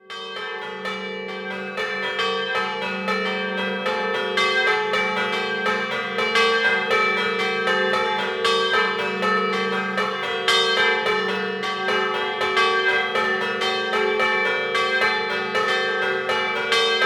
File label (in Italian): Maseris Scampanottata, campane